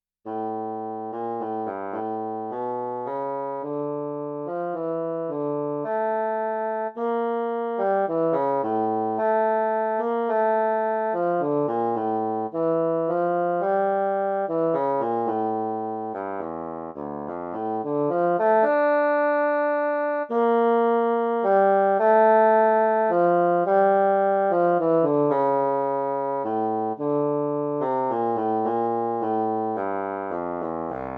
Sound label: Bassoon Lyrical